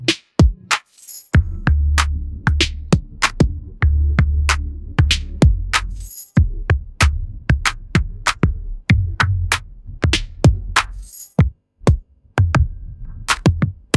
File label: ringtone hiphop